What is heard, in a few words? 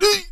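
on take damage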